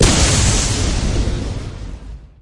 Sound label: gem countdown destroyed